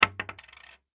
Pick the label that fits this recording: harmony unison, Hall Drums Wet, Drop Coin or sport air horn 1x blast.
Drop Coin